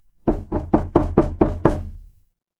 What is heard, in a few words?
door knock